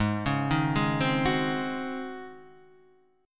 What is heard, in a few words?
C sharp Major 7th Suspended 2nd Suspended 4th G sharp